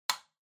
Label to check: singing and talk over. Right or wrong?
Wrong